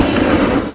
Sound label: flamethrower firing